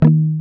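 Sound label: GUI D#, acoustic guitar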